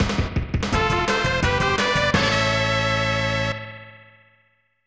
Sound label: Unused Jingle; Otoboke Ninja Colosseum; SNES